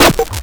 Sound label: grind, EMU XL-7